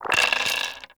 Dice Roll Five; Warframe; Koumei

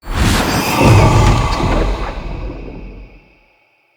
summon knight cast; Characters; Enemy; Mendicant; MndKing; Abilities

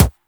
Weapon Punch Pistol; Warframe; Weapons

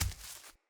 Walk Veg, Footsteps; Enemy; Mockery; Mockeries

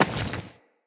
Walk Metal, Footsteps; Characters; Enemy; LadyDeora

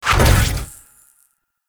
execute teleport; Warframe; PaxDuviricus

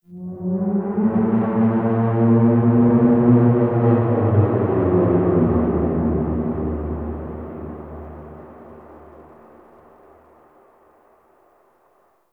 Terraforming Egg Vocal Wet; Warframe; Tau

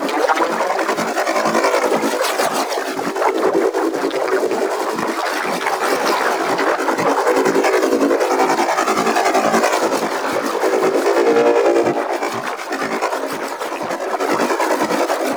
Aqua Board Base Loop; Warframe; Yareli

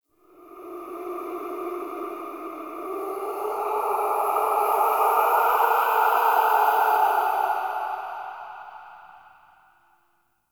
Ext Wind Death Howl; Ambience; Misc Outdoor; Warframe; Infested Eris

